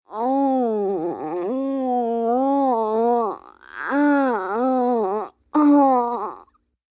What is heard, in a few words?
Moan Sick Teen, Moan, groan, child, boy, long, painful, agonizing, pain, cry, hurt; Groaning Sound Effects; human voice